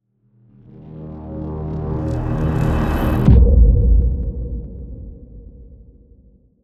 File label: Riser Boom